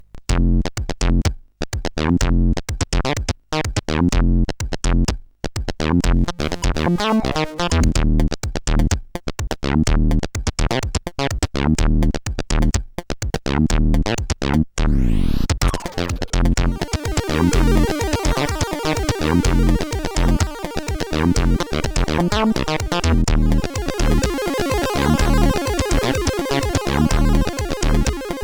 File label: Intro, CSG8580R5; Unboxed